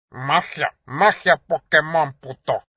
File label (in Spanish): magia; pokemon